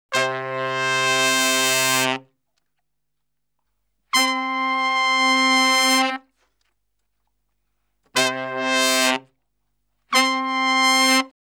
Salsa Picante; Masterbits; World Zone VOL-3

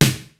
KICK SNARE; Kid Nepro; Elite; KICKS SNARES

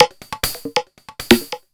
percussion loops 138; PS-45

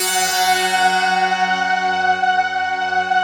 rough stack

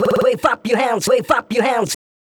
Vocals; Vengeance - Essential Clubsounds Vol.2; Special Sounds

